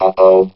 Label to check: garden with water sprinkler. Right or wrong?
Wrong